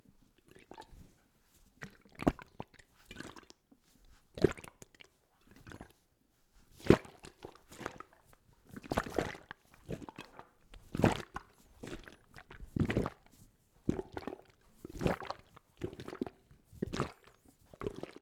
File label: Water Movement In Stomach Gurgling Hot Bottle Hot Water Bottle